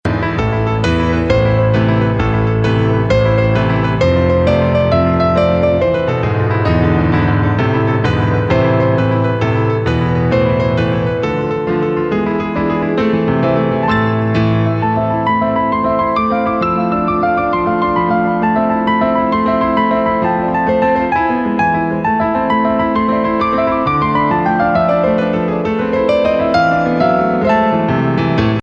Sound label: Tarantella, ringtones